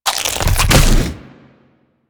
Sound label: Infested Splitter Detach; Warframe; Grey Strain